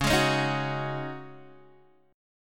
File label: Db Suspended 2nd Suspended 4th Guitar Open D